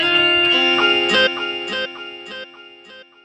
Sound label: Best Vivo Message